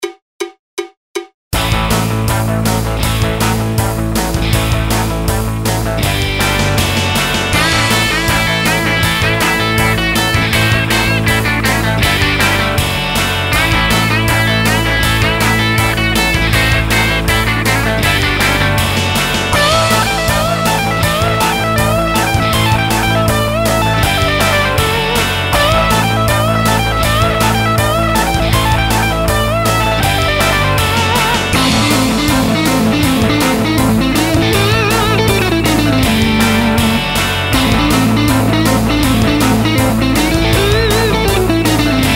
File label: 50 licks rock